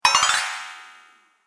Metal Magic Up; Magic Sound Effects

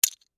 Legos Drop Move, Together; Foley; Drops (Sound Effects:Objects)

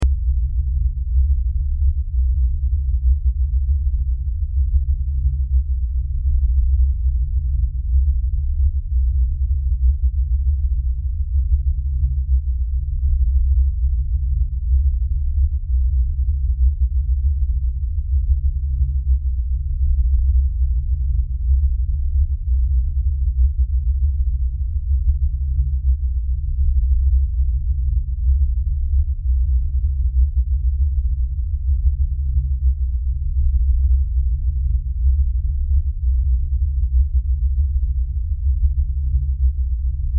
Sci Fi Spaceship; Sci Fi Spaceship Rumble Station Drone Deep; Spacecrafts

